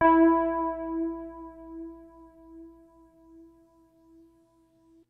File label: WURLI E-PIANO; Kid Nepro - Elite